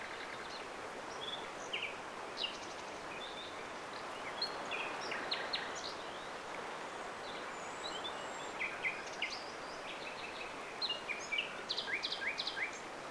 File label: birds no battle; WAR